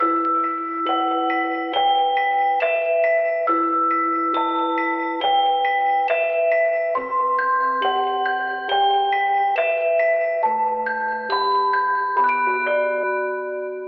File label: keys melodic music box, Sad Loops